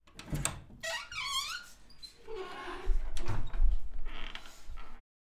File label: Door Opening